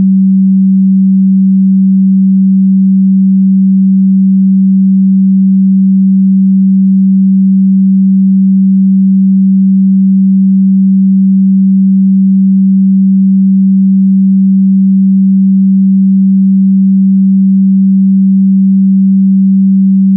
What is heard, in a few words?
SINE; SINE WAVEFORMS - LOG